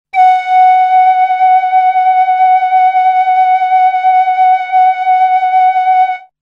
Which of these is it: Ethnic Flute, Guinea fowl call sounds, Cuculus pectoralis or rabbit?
Ethnic Flute